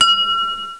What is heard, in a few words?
Crystal